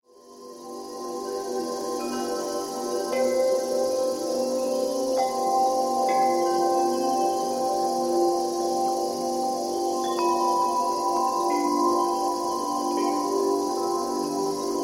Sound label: Amazonia, windchime